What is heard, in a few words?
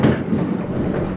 Lift Op; Grand Theft Auto Vice City; XBOX